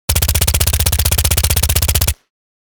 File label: submachine gun short burst; Engineer